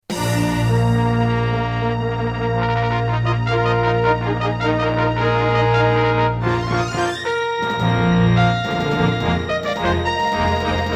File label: Intro, Roland SC-8820 55MAP; Dark Forces